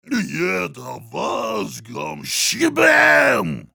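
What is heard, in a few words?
singing, German; Heavy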